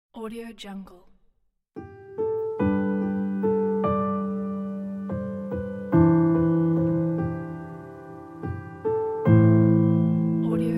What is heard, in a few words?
simple piano ident